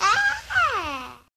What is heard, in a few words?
"ah", baby; Voice; ooh ahh